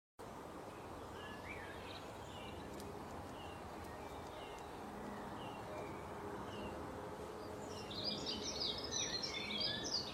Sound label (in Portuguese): canto do pica pau malhado pequeno